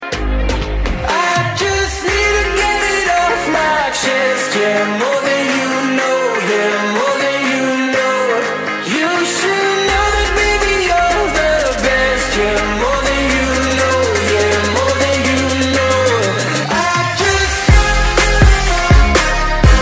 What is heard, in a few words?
ringtone